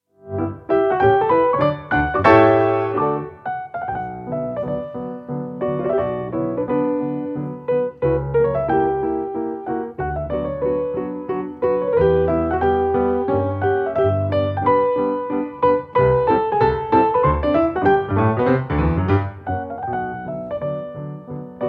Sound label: polonaise in D major for piano duet; Richard Wagner; op 2